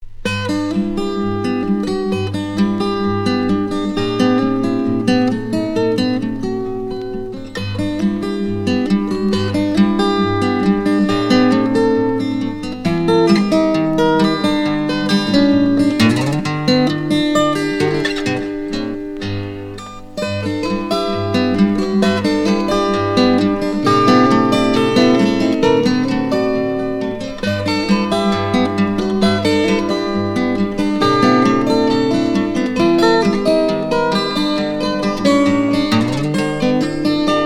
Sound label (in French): Boîte à musique